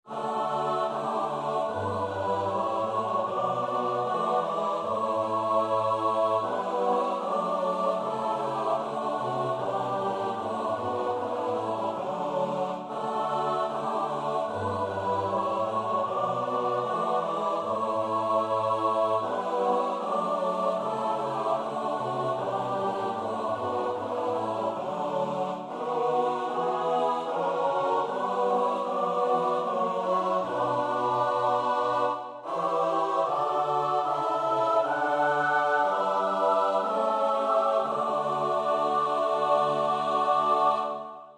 S-A-T-B, koorpartij oefening; Bach (Johann Sebastiaan); BWV 145

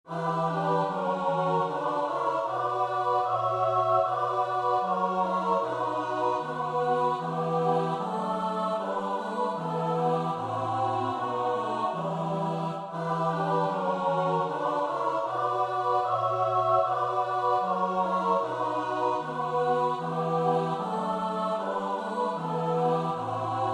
bas, koorpartij oefening; Bach (Johann Sebastiaan); BWV 158

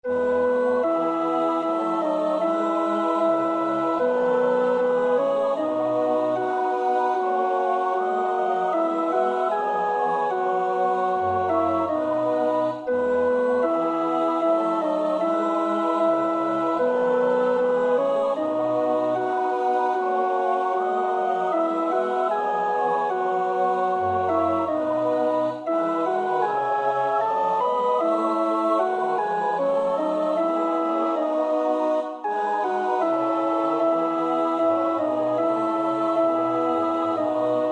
alt, koorpartij oefening; Bach (Johann Sebastiaan); BWV 139

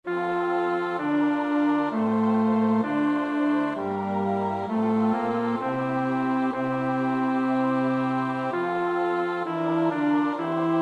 bas, koorpartij oefening; Bach (Johann Sebastiaan); BWV 146

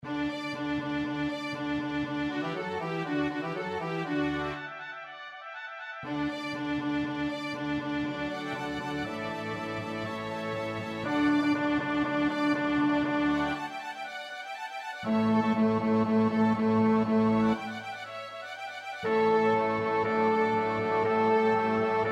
bas, koorpartij oefening; Vivaldi (Antonio); Gloria RV589

